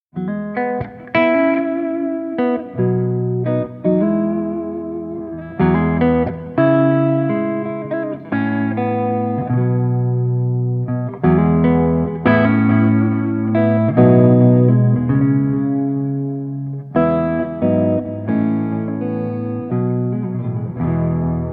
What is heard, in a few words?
solo guitar improvisation